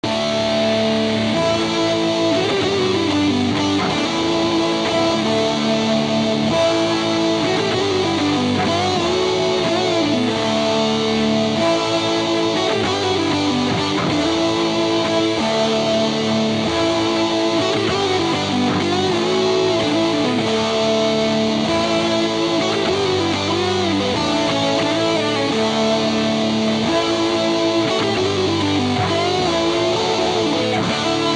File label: Little Big Muff power lilt